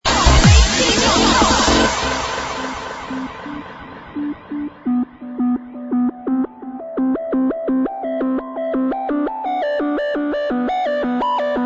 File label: Chaos Bass Keeps Pumpin, Happy Hardcore